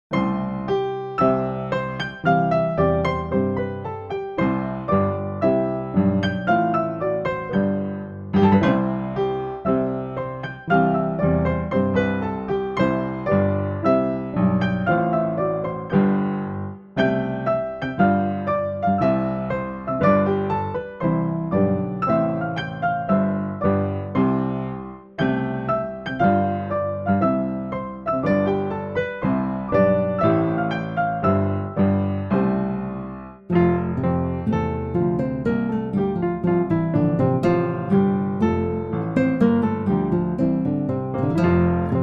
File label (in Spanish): himno de Espania deprisa piano